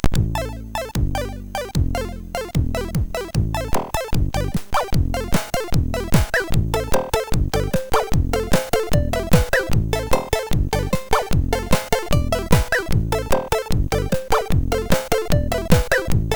Speed Music, MOS6581R4

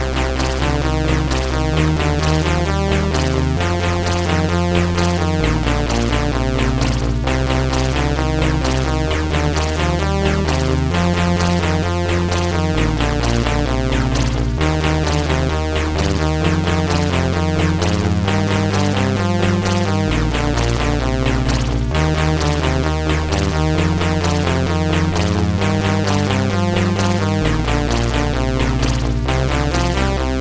Dingdong, MOS6581R4

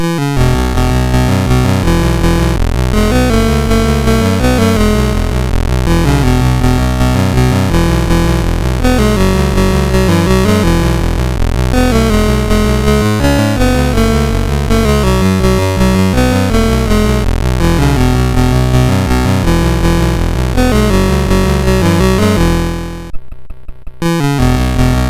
Fjaeril Vingad, sid MOS6581R4

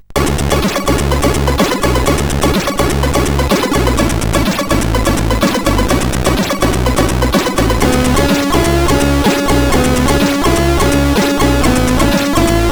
Four Channels, sid CSG8580R5; The Dungeon Master

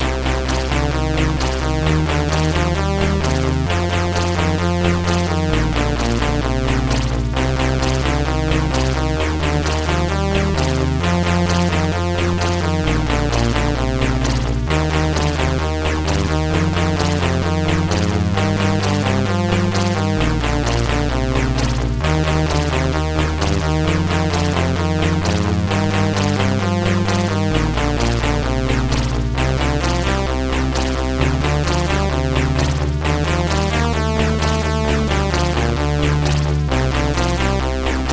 Dingdong, MOS6581R4

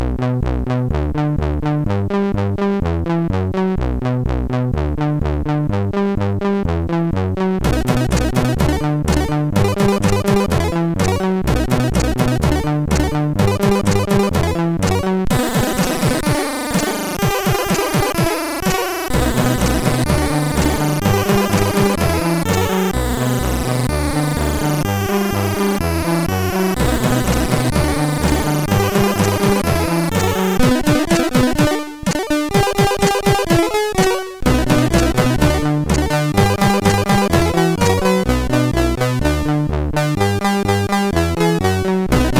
Hard Crash, MOS6581R4; sid

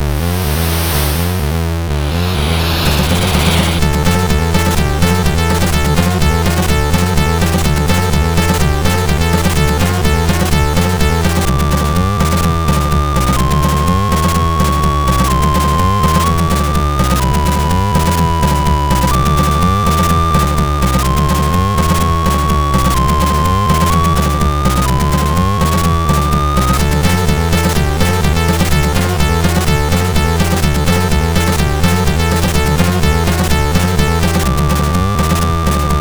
Shorty tune, MOS6581R4; Early Works

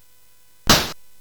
Dark Castle, CSG8580R5

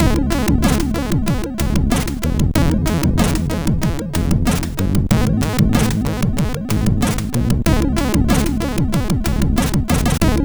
Loop, MOS6581R4

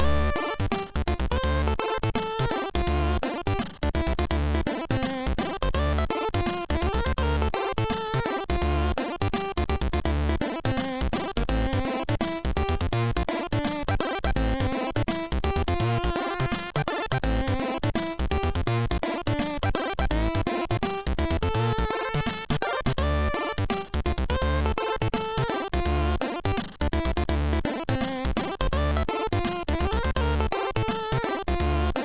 Su-Sweet, MOS6581R2

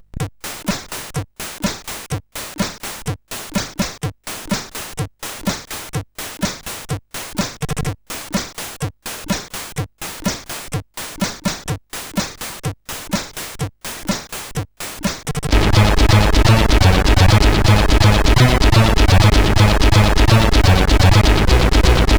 Techno, CSG8580R5

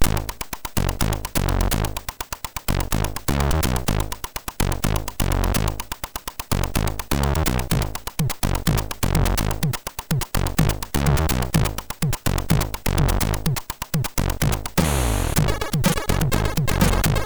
Go-Go Mix, sid MOS6581R3; hvsc

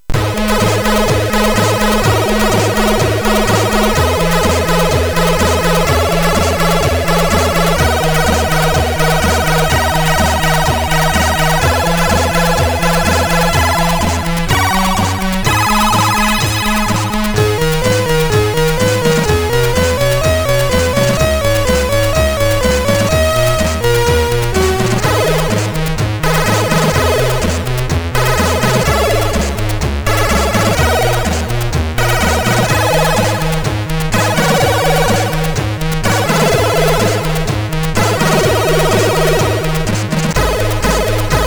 Sweet Blow, MOS6581R3; sid

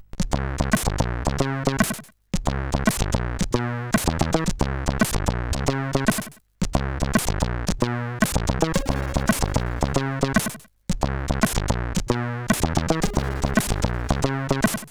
CSG8580R5; hvsc

